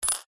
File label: foley money australian 20 cent coin set down on tiled table gently; zapsplat